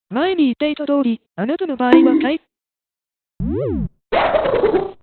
kick; sonic meme

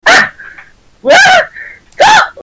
ahh wahh cukk

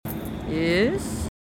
yiissss

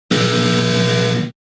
buzzer x; agt

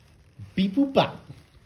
beep boop bop

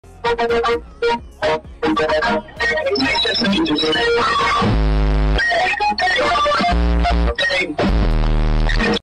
fail; nfl

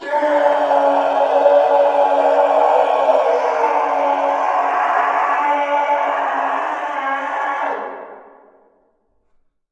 scream; gus mousehead

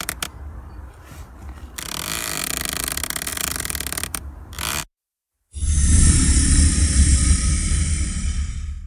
tree branch creaking with monster

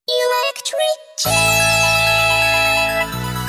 electric chair

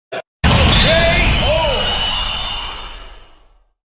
k o sound effect; meme

